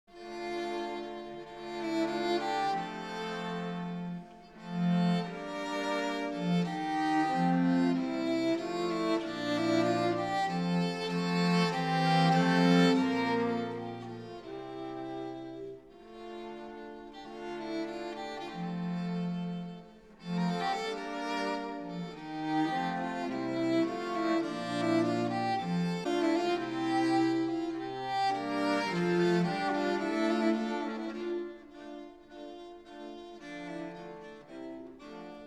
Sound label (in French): Basse danse